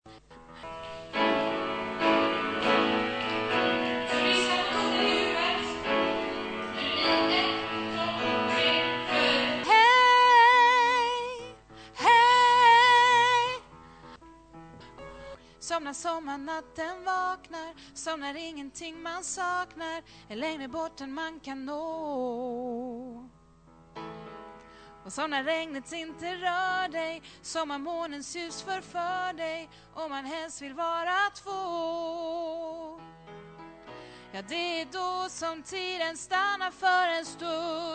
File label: tenor